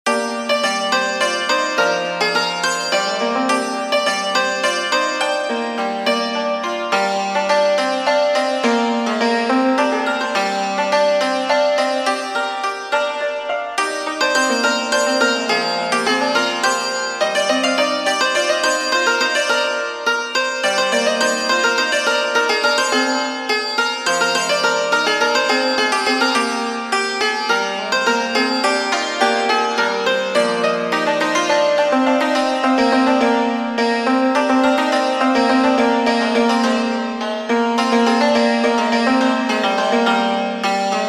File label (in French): duet pour santoor; amir hassanzadeh; primrose; local gilaki